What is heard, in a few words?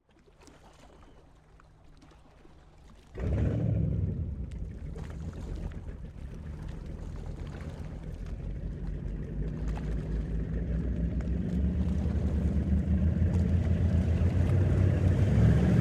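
Scarab 34 exterior startup approach away shutdown, Wellcraft Scarab 34; Pole Position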